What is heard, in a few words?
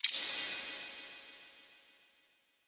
ui click